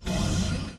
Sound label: atlas leg servo; Titanfall 2